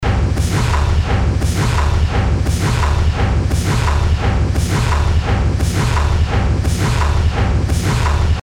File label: machinery loop